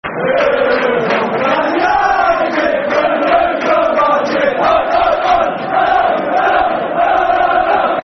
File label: Who The Fuck Are Man United, Football Chants; Man City Mcfc